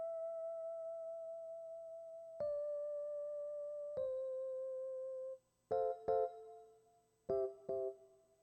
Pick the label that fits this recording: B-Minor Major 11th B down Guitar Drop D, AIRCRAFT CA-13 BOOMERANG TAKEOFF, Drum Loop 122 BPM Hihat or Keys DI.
Keys DI